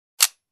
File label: Inserting pistol magazine